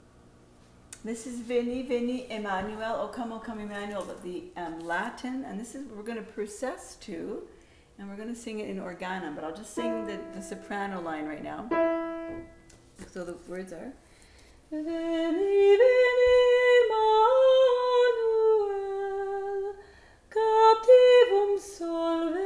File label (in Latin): Veni veni Emmanuel tutti